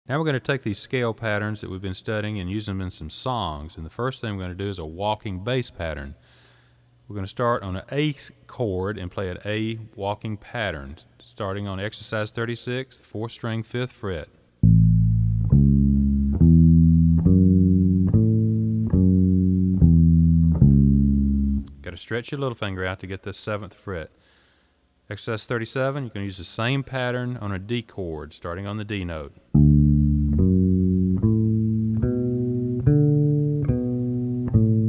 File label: Up The Neck Walks